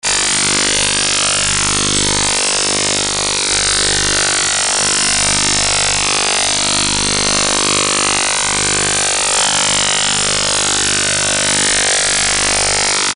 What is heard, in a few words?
Mystery 8300 kHz; ham